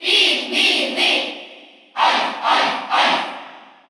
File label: Cheer Russian; Alph; SSBU